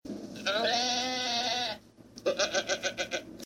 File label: goat laugh